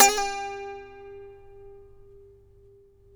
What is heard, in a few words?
saz G19; Roland - LA Composer vol. 3